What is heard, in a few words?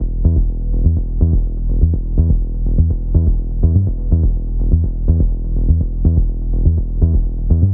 melodic techno